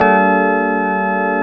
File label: EPiano Kord b; Mo' Phatt; Digital Sound Factory Mo' Phatt